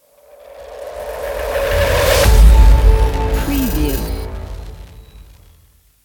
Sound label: hybrid and glitch logo